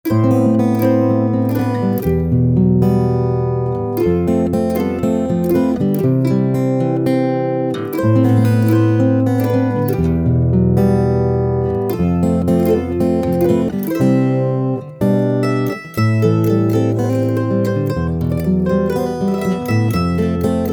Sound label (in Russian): duet gitary i mandoliny